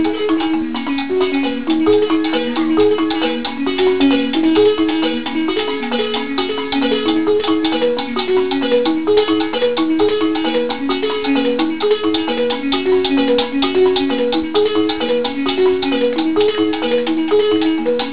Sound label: Xylophone; Lawra; Baaro